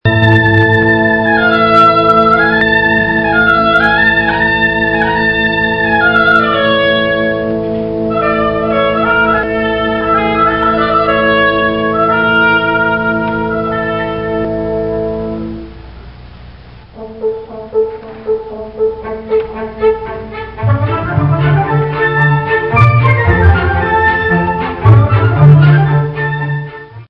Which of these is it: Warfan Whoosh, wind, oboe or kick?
oboe